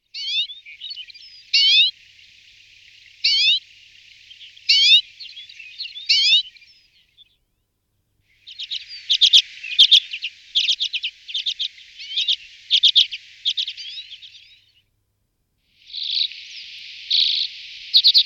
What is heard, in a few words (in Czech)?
Cecetka belava Carduelis hornemanni